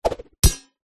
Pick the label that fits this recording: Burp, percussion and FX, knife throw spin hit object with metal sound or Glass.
knife throw spin hit object with metal sound